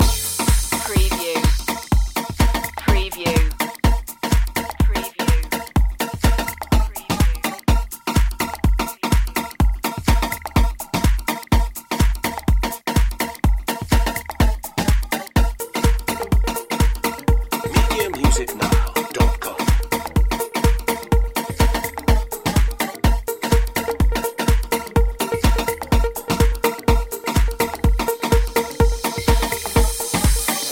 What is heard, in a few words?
progressive rocks loop